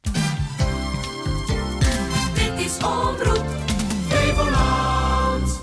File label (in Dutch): omroep jingles